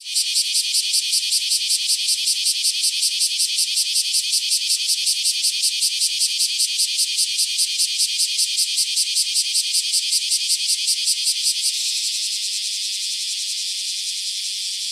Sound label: black cicada cry